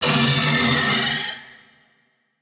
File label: fish mecha; boss